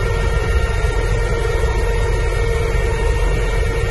inside cell medium stress loop stereo, Lynx internal audio loops